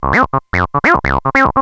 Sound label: square